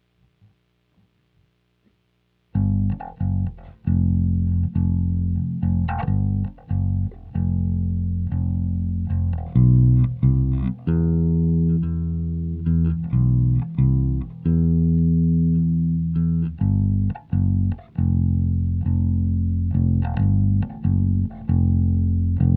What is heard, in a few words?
Bass